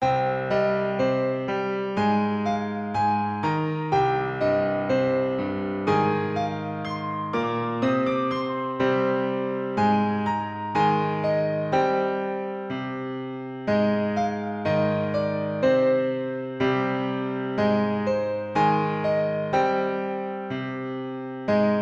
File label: piano instrumental